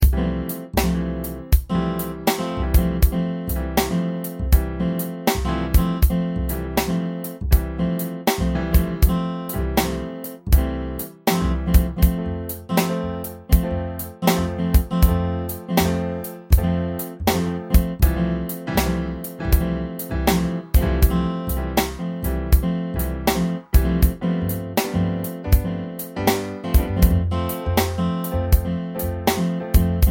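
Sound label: blues track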